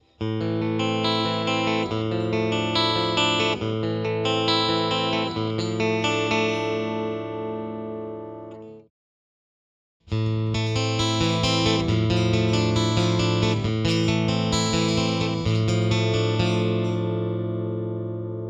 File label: mag vs piezo